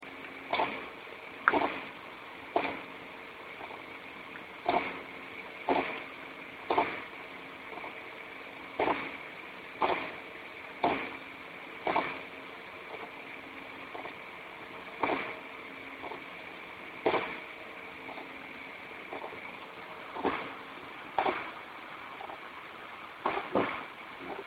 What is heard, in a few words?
drive